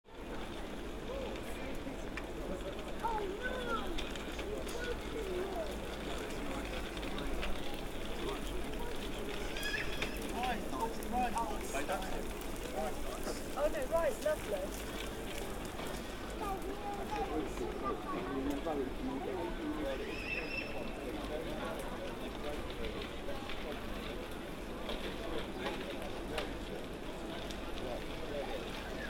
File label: Harbour outside fish shop; whitstable